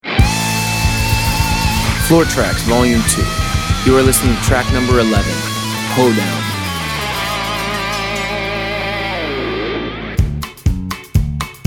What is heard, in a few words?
Hoe Down